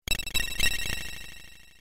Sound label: ice 2 part 2; ff3; ff3us